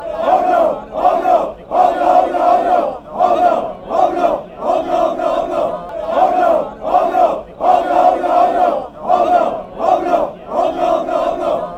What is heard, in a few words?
bohemians chant